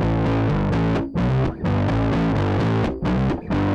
bass verse loop

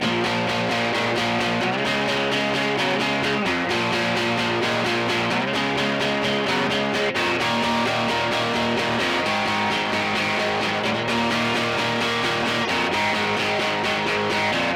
Rhythm Guitar; Guitars

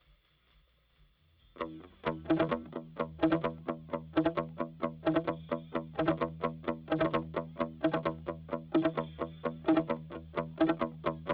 Guitar - Palm Muted

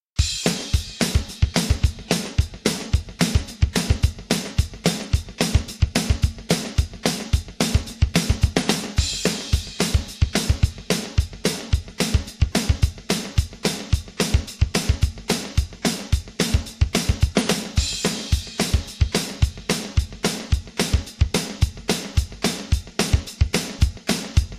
drums

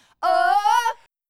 Vocals

